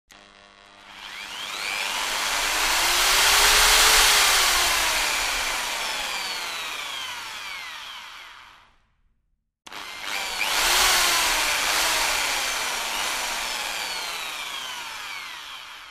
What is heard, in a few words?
Drill Idle Run, hand drills